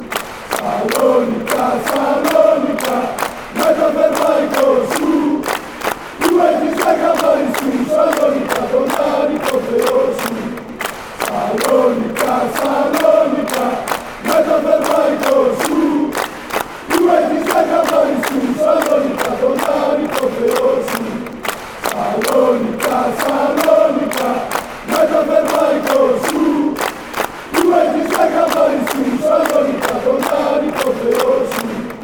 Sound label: aris chant